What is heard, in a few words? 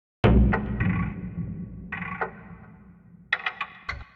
Digital Dance Zones 107 bpm, drums; MIDI Clips; Ableton; Mood Reel